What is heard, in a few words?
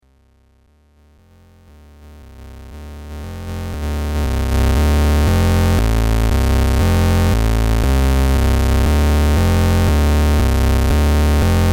PULSE